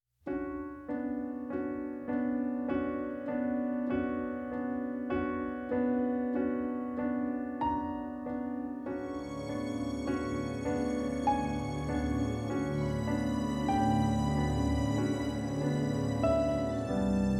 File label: Suspense